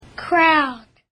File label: crowd